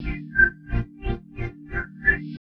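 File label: Pad Synth; Equipped Music; Loungin House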